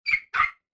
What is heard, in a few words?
bark; poppy